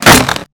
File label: Barrel Break; Scooby-Doo - Barrel of Treats